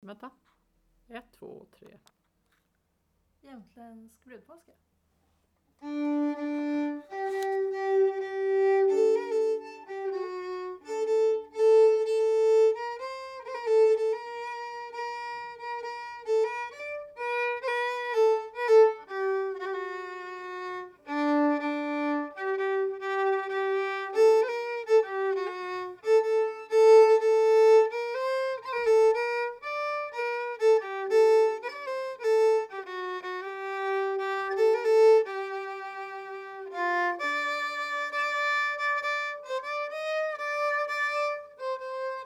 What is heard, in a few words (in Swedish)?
Jämtländsk brudmarsch